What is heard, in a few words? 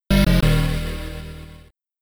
Miss; Loopz; Amiga